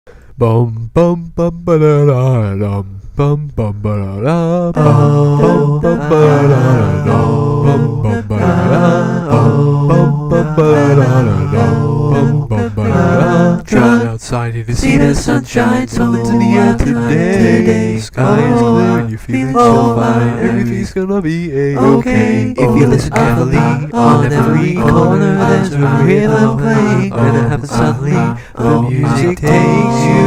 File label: Impromptu Acapella